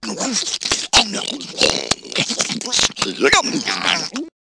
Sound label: EATING